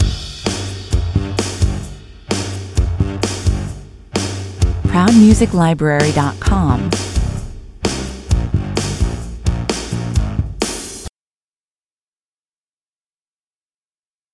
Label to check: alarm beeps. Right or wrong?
Wrong